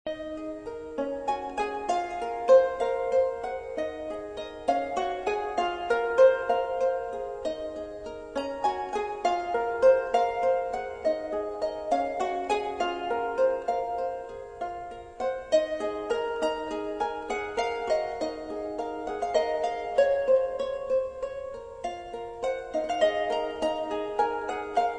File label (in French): harp; instruments medievaux